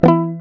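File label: GUI E2, acoustic guitar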